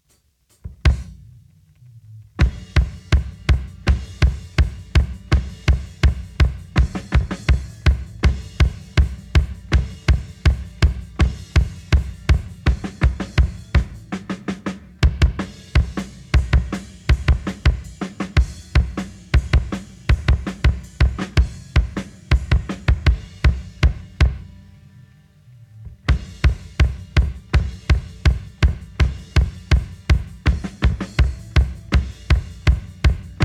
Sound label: drums